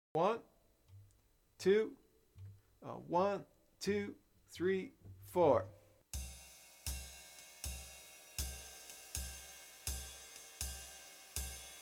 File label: Warm Up, Bop Drumming; The Art Of Bop Drumming; Riley